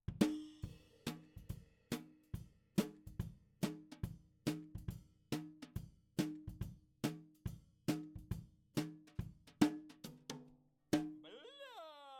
SNR top

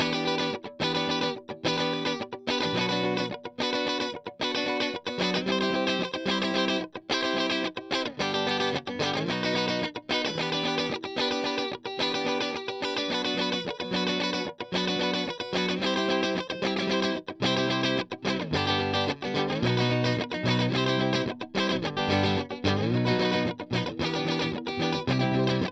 Strum

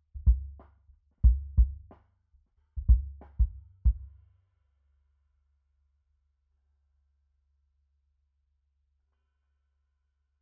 kick sub

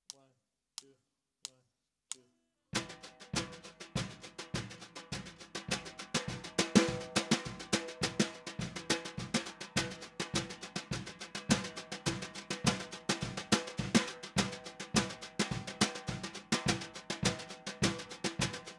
SNR

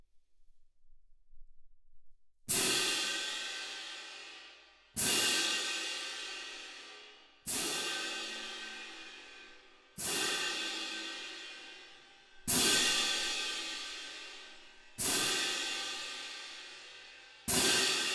Twin R Perc

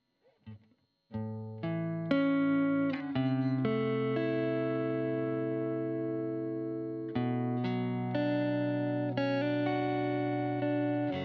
GTR Royer

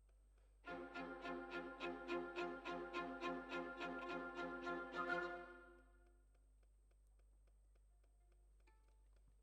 Violins, jazz for piano

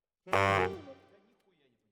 Bari, RNR for piano

